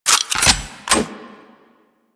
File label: Rifle Reload; Grn Kuva Lich Rifle